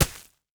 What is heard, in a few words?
Weather Snow Dropping On Snow